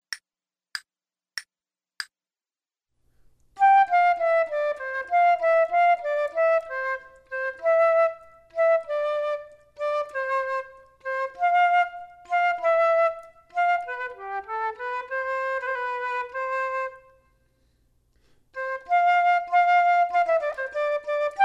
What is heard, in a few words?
Duets flute; Selected Duets for Flute Podcast